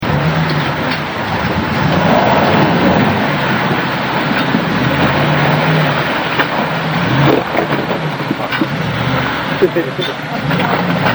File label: waterfall